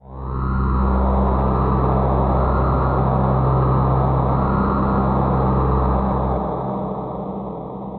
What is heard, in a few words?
Drone; Laboratory; Prophets